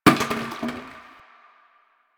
Janitor Plastic Bottle Water Drop; Warframe; Ambience; Nineteen Ninety Nine

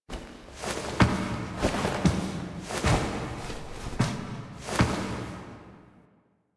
Foley B; Drifter; Warframe; Quests; The New War; Lotus Gem Part Two

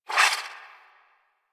Swing, Melee

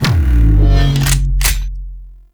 Shotgun Pickup Stinger; Venus; Warframe; Veilbreaker; Kahl

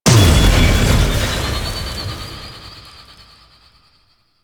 Plunge Ability Shockwave; Enemies; Abilities; Warframe; Duviri; Dax Knight

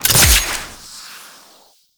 Grapple Throw; Enemies; Weapons; DE; Warframe; Teshin

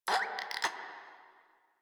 Infested Crawler Breath; Warframe

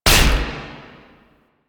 Rifle Shot; Duviri; Incarnon Adapters

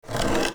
Wood Stool Drag Scrape; Objects

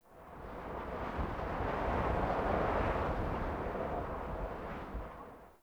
Fort Wind Gust Low Mid Howl; Ambience

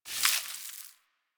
Hoverboard Terrain Caster Snow; Warframe; DE; Vehicles; Venus

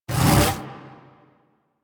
Cast; Warframe; Warframes; Excalibur Umbra

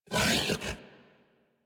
Feral Vocal Melee Attack; Enemies; Kubrow; Warframe

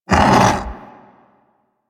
Vocal Death; Warframe; Hunting Critters; Undazoa

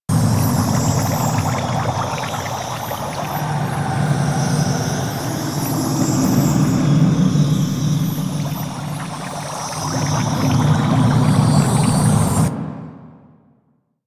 Fishing Action Loop; Duviri Maw Fishing

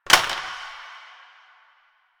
Plastic Drop; Ambience; Warframe; Nineteen Ninety Nine

